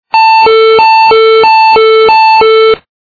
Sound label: alarm ambulance